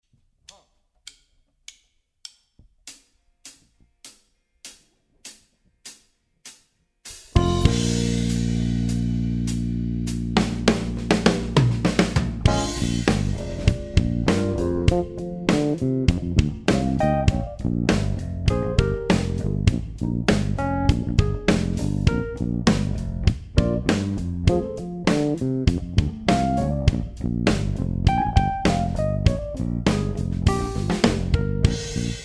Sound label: No Guitar Bb